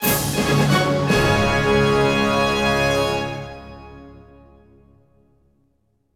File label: Combat Win; The Hobbit; PS2